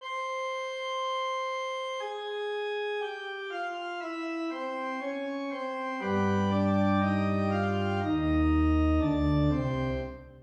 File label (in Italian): Fuga; Fuga n. 5 originale